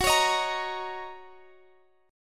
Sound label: F sharp 6th F sharp